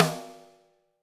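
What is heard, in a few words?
snare drum, Drums; Bob Clearmountain Drums I; SD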